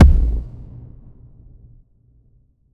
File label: reverb tail impact a low